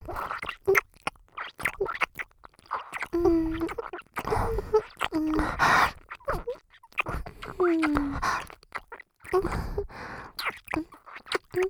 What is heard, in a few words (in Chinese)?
雙耳親吻; 姐姐的雙耳親吻